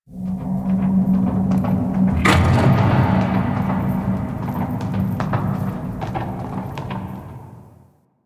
Ghost Horse; Dagath; Warframe; Ambience; Clan Dojo; Dojo Misc

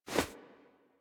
Movement Foley; Warframe; Wisp

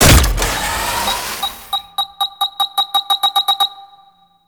Plant Bomb; Warframe; Veilbreaker; Devourer Kahl

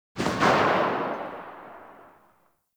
Fire Tail; Weapons; Seer; Warframe

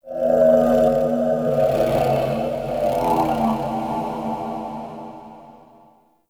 Root Cave Didge Texture; Ambience; One Offs; Neathuns Root Cave

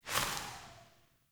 Sparrow Death State One Wing Flaps; Animals

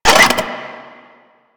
Flying Robot Engine Start; Warframe; Veilbreaker; Quests; Ambience; Kahl

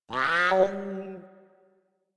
Pet Meow; Enemies; Warframe; Catbrow; PetShip

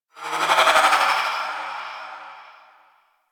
specter capture loop end; Warframe; Ambience; The War Within

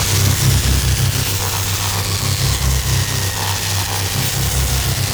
Galleon Hazard Laser Beam Loop; Vor Galleon Fight

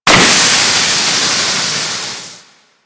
Fortress Steam Hazard

